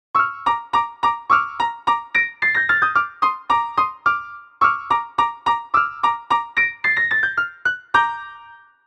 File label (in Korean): 피아노